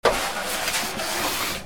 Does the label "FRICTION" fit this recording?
Yes